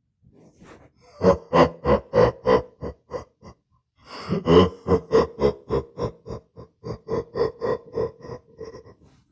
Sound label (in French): zwart hert rire